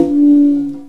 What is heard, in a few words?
CONGA SET; OMI - Universe of Sounds